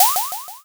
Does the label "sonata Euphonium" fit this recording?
No